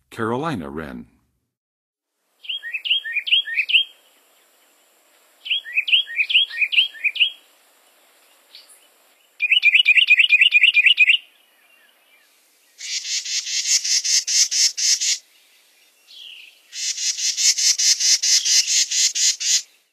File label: Carolina Wren, Western USA Songbirds; North America